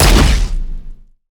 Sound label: Monster; Zenless Zone Zero